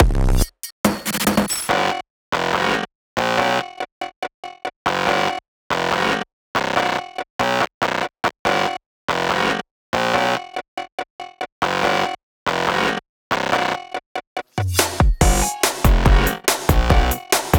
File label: Instrumental